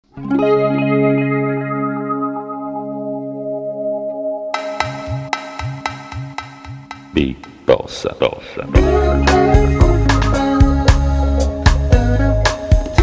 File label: Big Bossa